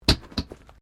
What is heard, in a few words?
Wreck Wheel Flat Bounce, Wrecked Cars; impacts, crashes, scrapes; Objects